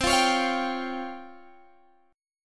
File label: C sharp Major Sharp 11th C